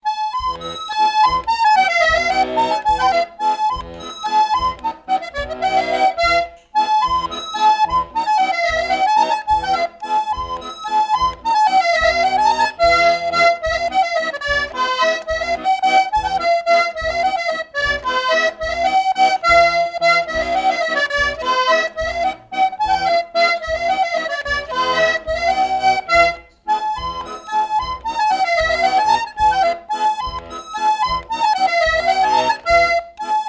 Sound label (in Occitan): borreia bourree, accordeon